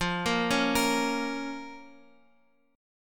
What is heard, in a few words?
F Suspended 4th Sharp 5th F down Guitar Open F; Fsus4#5 arpeggiated; JGuitar